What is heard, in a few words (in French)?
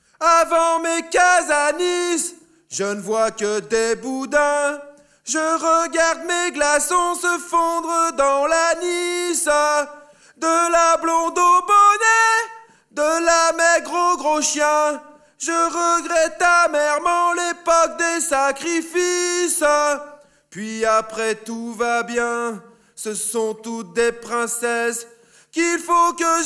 Ambiance de Merde